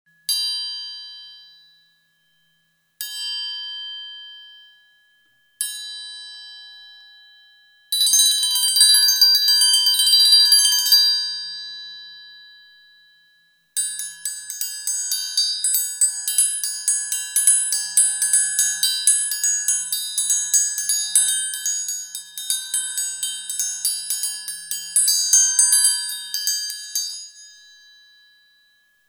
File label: triangle